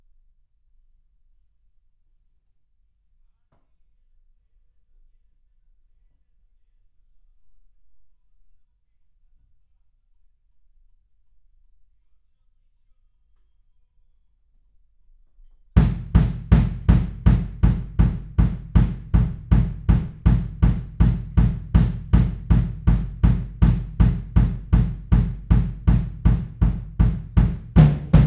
Room, 160 BPM

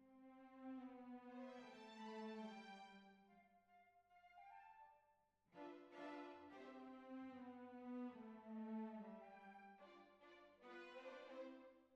Cbasses

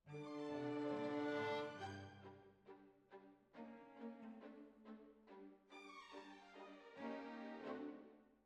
Oboe

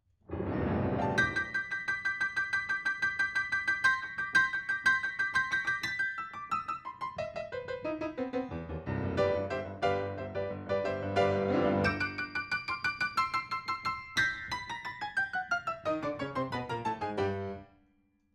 PIANO, RNR for piano

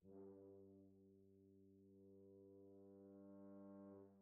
Horns

